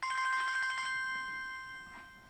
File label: chime, ringtones